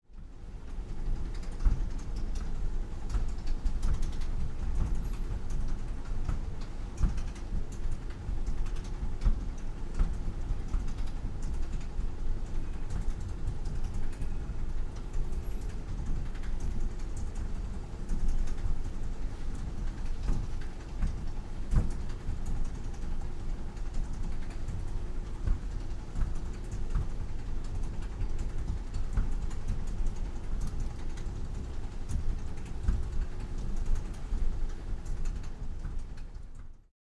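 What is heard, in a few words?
sounds of baggage carousel in airport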